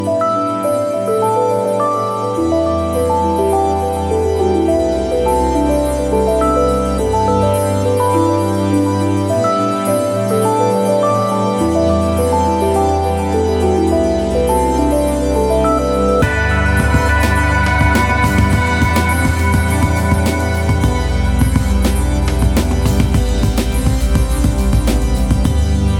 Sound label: Electronic Ringtone